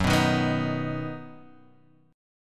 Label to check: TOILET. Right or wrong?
Wrong